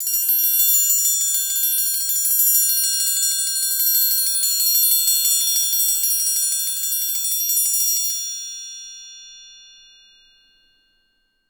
TRI TRM HI, orchestra; Best Service; Peter Siedlaczek's Advanced Orchestra

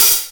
HHO, Drums, Alesis Hr16b

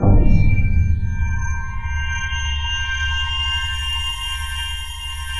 ICICLES L; NorthStar - The Wizard VOL-2; DRY ICE

